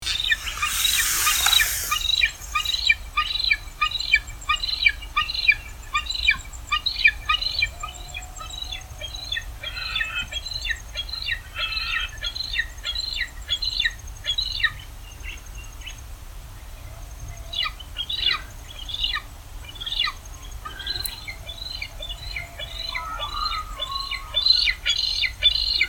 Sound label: Aquila Audax; Wedge-Tailed Eagle; Call